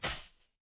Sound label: impact slam